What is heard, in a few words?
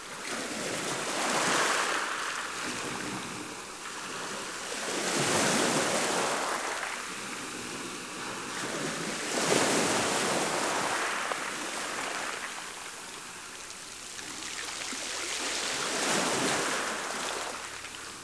waves; de new inferno 2010